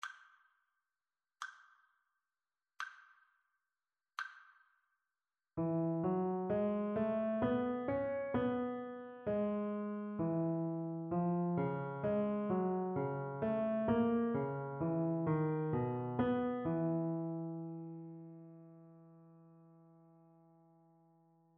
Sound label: partially completed melodic dictation